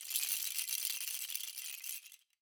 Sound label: rattles Roll, Raw Perc One Shots; Slate; SD SEISMIC Drum Sample Pack